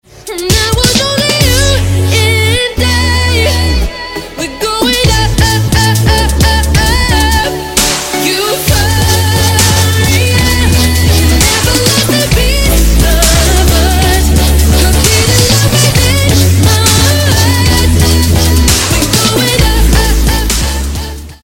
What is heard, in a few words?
BEAT